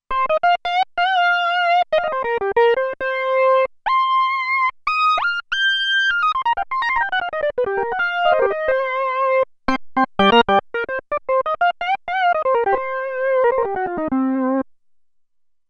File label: Sunset Lead, Roland Alpha Juno-2; Factory 2